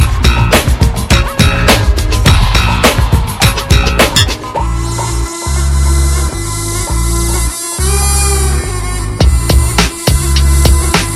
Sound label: guitar music ringtone; Hip Hop Ringtones